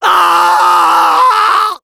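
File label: pain critical death, French; Medic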